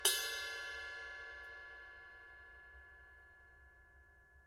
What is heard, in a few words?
18in A Thin Crash, cymbal sound